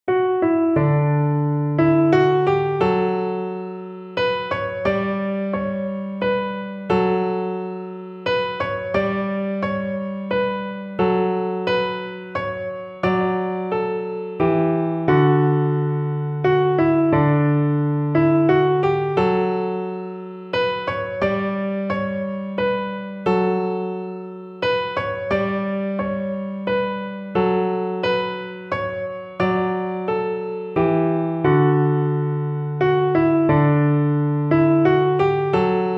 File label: piano; christmas; the first noel